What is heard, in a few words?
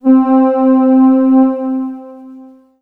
Casio VZ-10M Soft Glass C4